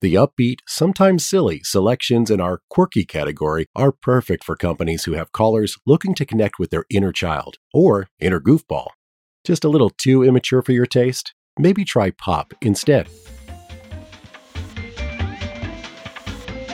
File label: Quirky, music on hold